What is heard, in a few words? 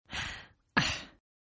Sigh Female; Exhaling; Voice; Partners In Rhyme